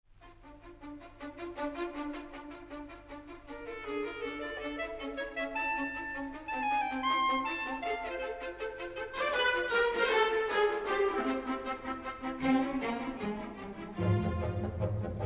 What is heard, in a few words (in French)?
SYMPHONIE EN SI BEMOL MAJEUR Allegro marcato; PROKOFIEV; N° 5; OP. 100